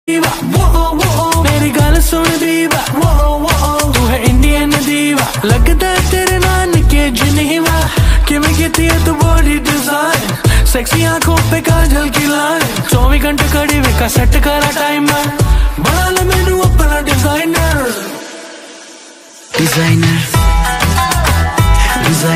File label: hindi ringtone